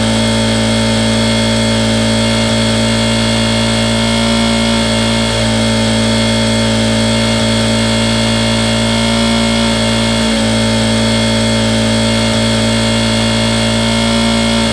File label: 7000 RPM, YBR125